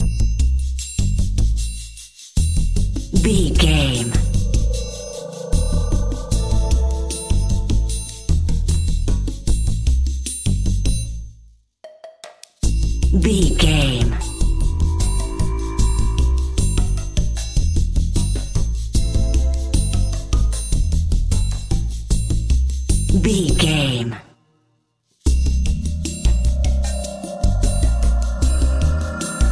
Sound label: top secret mission